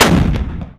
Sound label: Excalibur Howitzer Shot